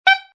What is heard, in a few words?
Sax Squeak, A saxophone squeak.; Saxophone Squeak 2; Funny Musical